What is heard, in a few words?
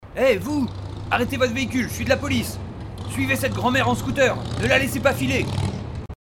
Police; Test DeVerberate